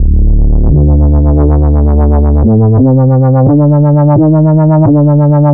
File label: Vibes Bass, Drum n Bass; KJ Sawka; Drum n Bass & Beyond